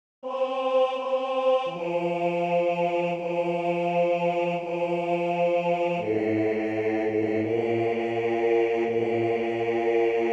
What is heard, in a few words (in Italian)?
se tu sei con me Basso